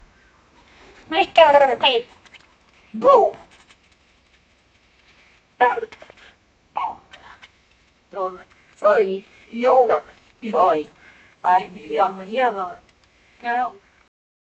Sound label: mallard male calls a fema